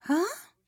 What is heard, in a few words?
Female Reaction Suprised